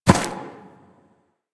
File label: Ram Step Single Grass; Animals; DE; Warframe; Quests; Duviri